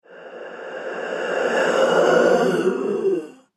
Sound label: Ghostly Halloween Monster